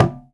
GENDANG G5, GENDANG DRUM; Spectrasonics - Heart of Asia CD2

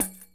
Tamborine, World Percussion One Shots; Sony CS

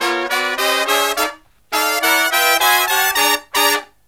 SLEEP, Killer Brass Riffs

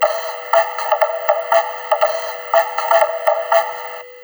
RYTHM 120, Drum Loops Crazy Processed

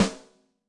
Blu Rim, Yamaha DTXpress-II snare

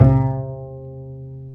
Bass, Roland

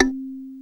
KALIMBA LO; CALIMB+STEEL

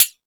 House Machine, E-MU Pro-Cussion

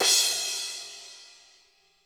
BEAT KITS, CY CR; Crypton - DrumBase5000

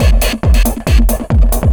138, Techno, drum loops

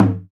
Kendang Stick, drum machines

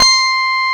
Korg CX3, C 1

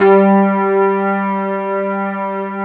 FENDER G2; USB Soundscan vol.09; AKAI; Keyboards Old School

